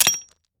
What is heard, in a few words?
swap